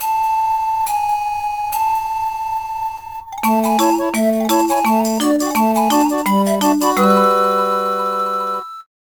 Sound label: Song of Birds (Long); Spirit Tracks Original Soundtrack